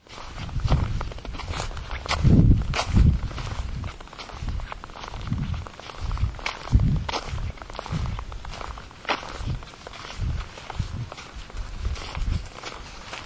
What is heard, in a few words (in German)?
Heimweg, Murmansk; Soundmap Murmansk 2012